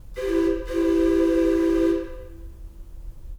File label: boat whistle